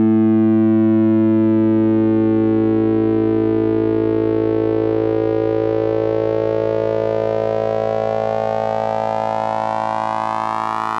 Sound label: pulsars masking